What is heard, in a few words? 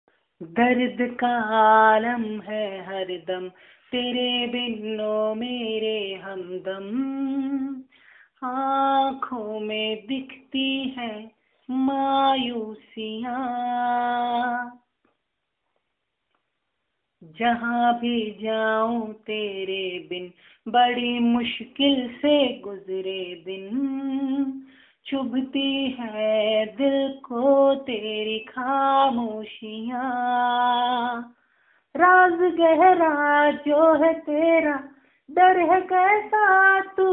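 lofi hindi female sung; vocal acapellas; various acapellas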